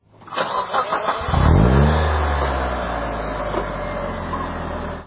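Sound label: sound of an engine with mileage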